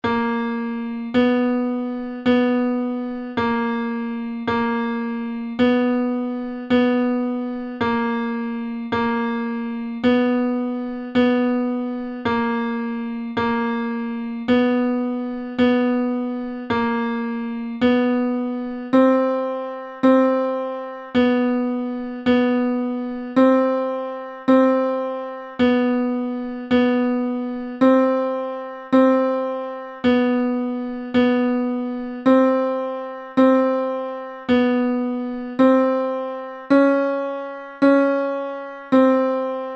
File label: Chromatic Trainer E, bansuri